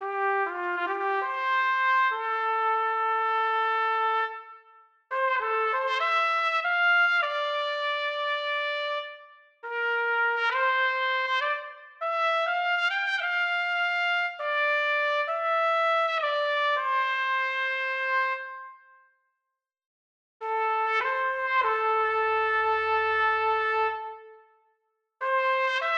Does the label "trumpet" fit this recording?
Yes